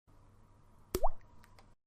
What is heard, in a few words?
Water Drop Sound Effect